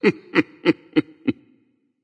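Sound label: nonverbal heh heh; smite; voicepack; merlin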